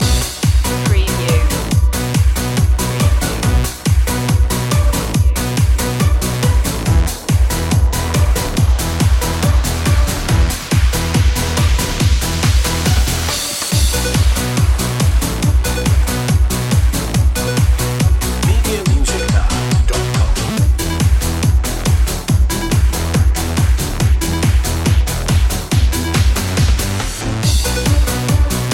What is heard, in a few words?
russian raver